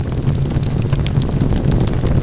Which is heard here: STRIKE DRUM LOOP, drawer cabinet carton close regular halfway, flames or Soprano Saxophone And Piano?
flames